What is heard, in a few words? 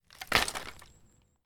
Dropping Wood